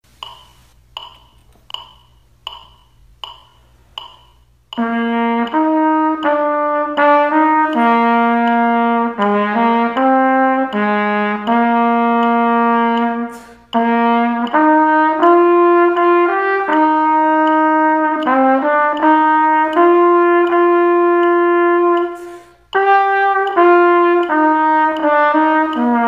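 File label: trumpet only, quarter note=80; Play-a-long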